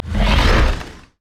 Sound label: pain; LoL; trundle